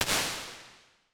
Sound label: 1.5s Basement, Impulse Responses; Medium Spaces; Indoor Spaces; Apple